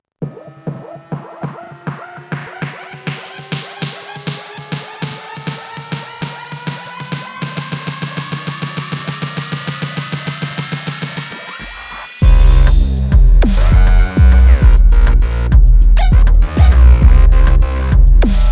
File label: HipHop; OF FATHERS AND SONS – Soundtrack